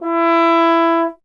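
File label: bass trombone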